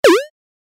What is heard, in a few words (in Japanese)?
ジャンプ レトロ